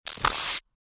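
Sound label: interference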